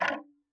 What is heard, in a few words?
Tick, Clocks Kitchen Clock